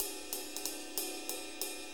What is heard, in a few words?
6-8 Ride, HiHat & Ride Loops